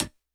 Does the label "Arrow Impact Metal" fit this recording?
No